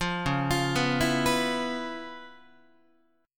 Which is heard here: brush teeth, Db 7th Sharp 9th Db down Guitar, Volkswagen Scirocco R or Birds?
Db 7th Sharp 9th Db down Guitar